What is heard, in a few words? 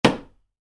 Wood, Light Impact: Plastic; Wood Sound Effects; Wood (Sound Effects:Materials); Stuart Duffield